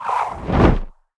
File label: Otter death woosh